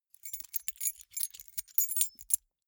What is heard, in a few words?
key ring small rattle hard